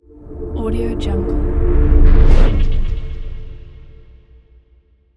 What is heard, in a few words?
riser swoosh